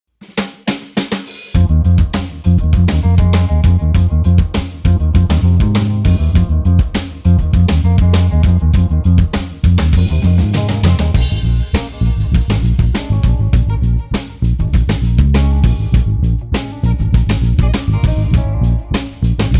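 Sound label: Street Guitar Combo Cool, 100 bpm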